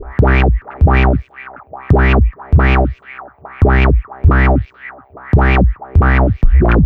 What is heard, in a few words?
Electronic Bass